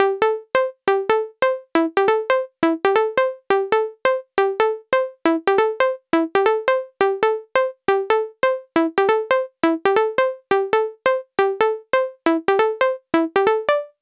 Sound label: 137BPM Pluck DRY, Dminor; Melody & Synth; Vengeance Samples; Universe Kit